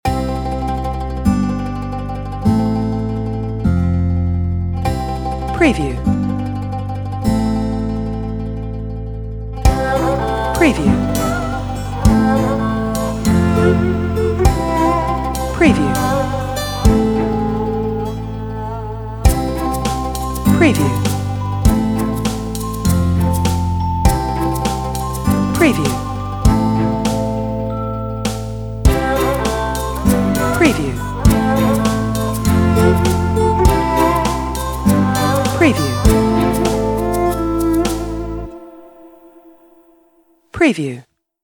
Upbeat Ethnic Arabic Islamic World Music

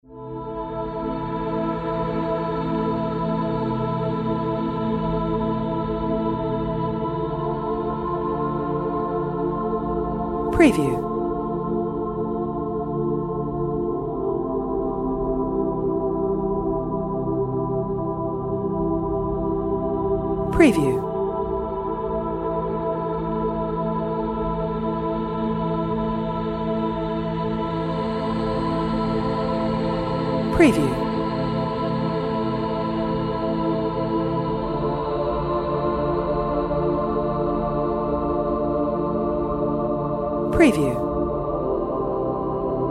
Ambient Calm Nature Relaxing Background Music

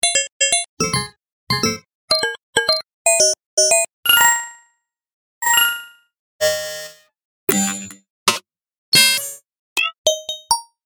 Feedback

